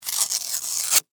Paper Wrapper Rip, Office, Paper, Wrapper, Rip, Tear, Open; Paper Sound Effects; Offices; BlastWave